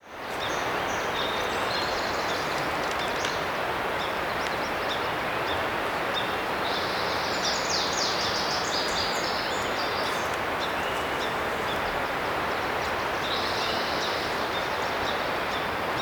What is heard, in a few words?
Sound Sculpture birds and road